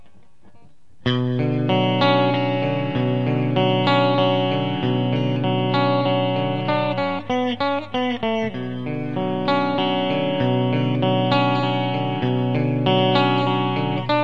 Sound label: riff